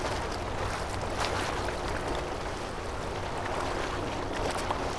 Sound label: ENV WATER DUNGEON ENTRANCE